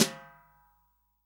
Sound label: SWING SNARE CHROMATIC, Real Drum Kit; REAL DRUMS